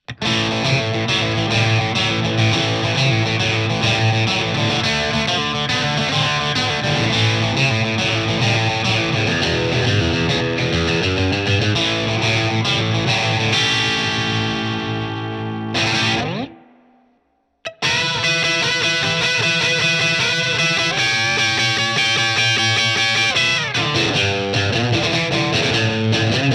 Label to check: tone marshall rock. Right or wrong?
Right